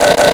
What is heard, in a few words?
pickup deny